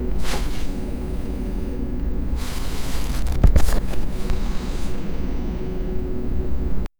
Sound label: book sliding off shelf; deep breath